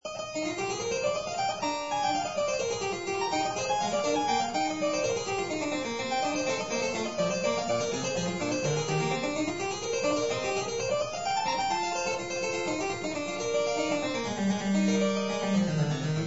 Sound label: Courante